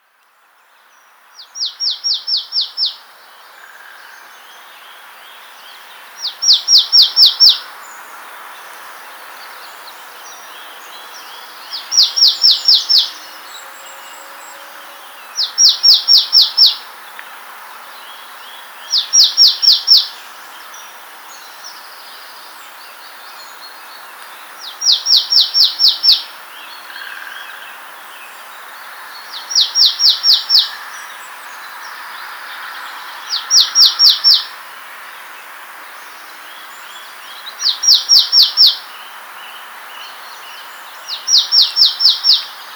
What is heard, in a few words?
Poecile palustris; SETE